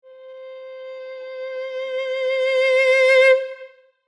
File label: Violin crescendo